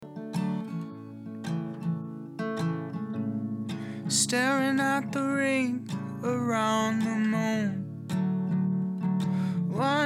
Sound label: Click Bleed Repair